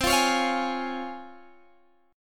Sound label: Db Major C; Db/C; strummed